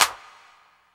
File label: CLAPS HOU; Best Service Dance Mega Drums